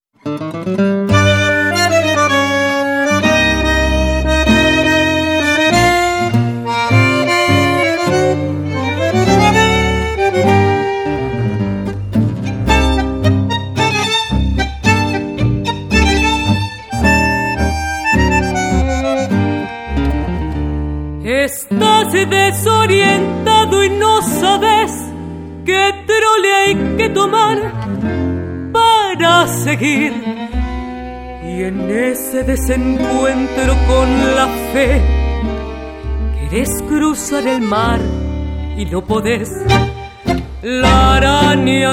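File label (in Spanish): tango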